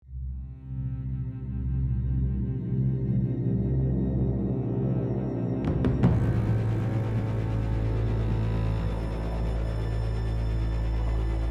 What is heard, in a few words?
Tense Loop